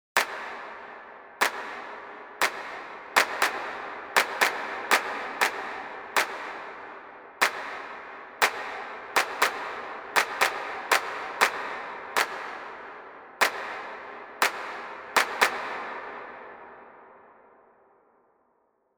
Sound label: reverb; ducking; too wet